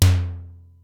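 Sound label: Simmons SDS-9 mid tom, Drum Machines; Factory D4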